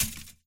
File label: chainlink tap; scripted sequences, a2